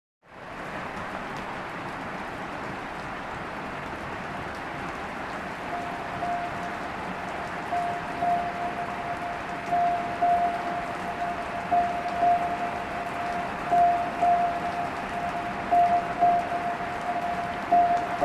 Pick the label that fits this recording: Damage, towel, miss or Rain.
Rain